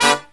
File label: LOW CHORD, Killer Brass Riffs